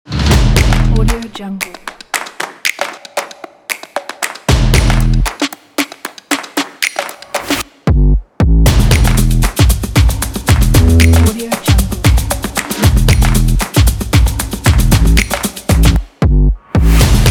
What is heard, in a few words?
drums